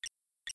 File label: Computer Beep; Mumble